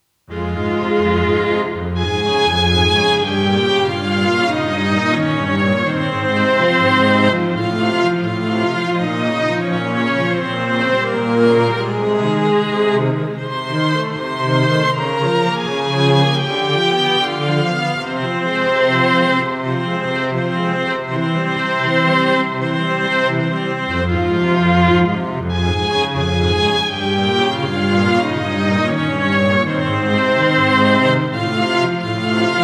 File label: Lexicon String Hall